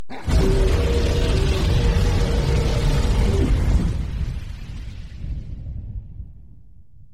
dragon flame sound effect; skyrim

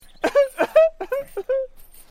cry

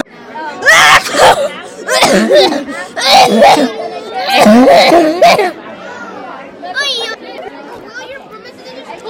hard coughing

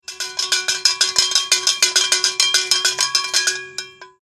cow bell

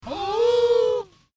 inhale

